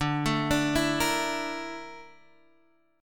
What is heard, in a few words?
Bb Major Sharp 11th, chord